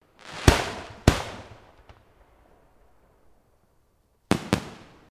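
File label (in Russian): отмечаем ежегодный фестиваль петард фейерверков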